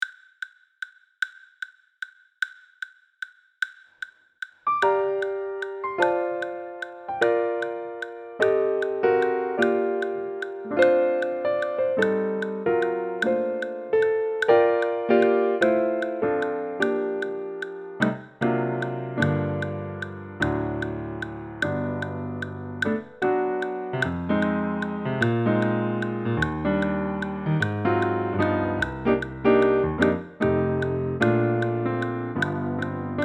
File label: Bb piano fast, sax